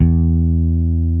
BAS; Best Service Gigapack 2

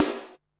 snare, techno 138 BPM

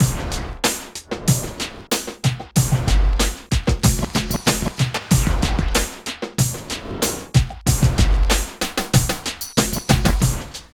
99 LOOP -L; East West - Phat & Phunky CD2; 90's Sample CDs

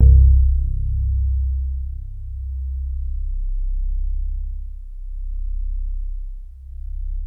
DX7 SOFT C1, E-PIANO; Music Store

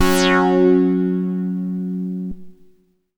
MASS LEAD, SYNTH; Keith Emerson Signature Series

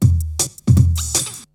BREAKBEATS, TECHNO

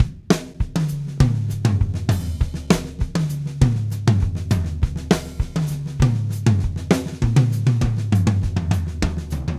Drumset Solo; Sonic Foundry (Sony Creative Software); Joe Vitale Latin Percussion; Solos

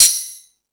Hip Hop, Drum Machines

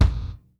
GATE, KICK DRUM

